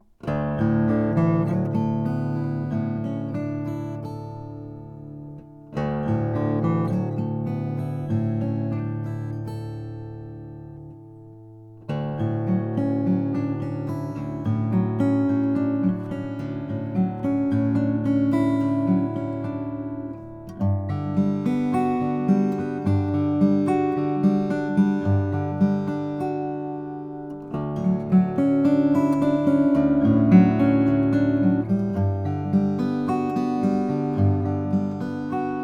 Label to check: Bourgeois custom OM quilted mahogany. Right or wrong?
Right